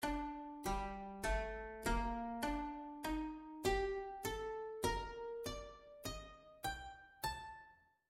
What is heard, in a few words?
koto; AJ Hipkins